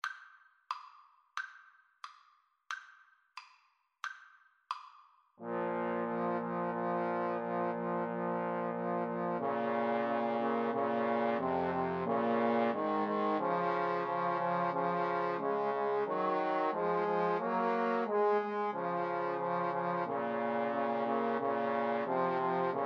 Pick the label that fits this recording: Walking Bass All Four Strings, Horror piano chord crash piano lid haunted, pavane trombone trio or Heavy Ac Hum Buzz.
pavane trombone trio